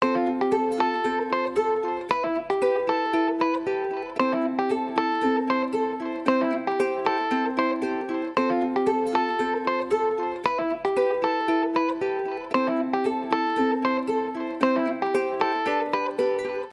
Acoustic Strings

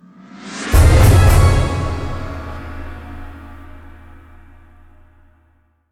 Cinematic Triple Hard Stab

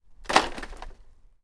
Dropping Wood

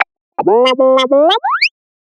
Strange Laser

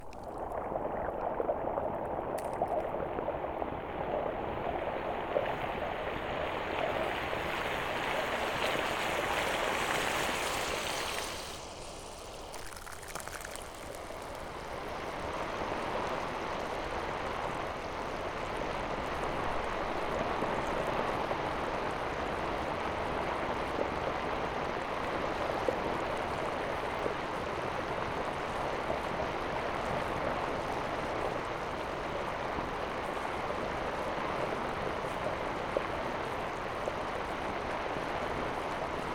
Drainage Pipe Suds

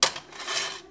Sword Taking

